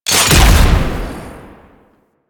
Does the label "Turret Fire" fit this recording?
Yes